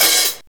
open high hat e key, Royality free open hat sound tuned to the E note. Loudest frequency: 6873Hz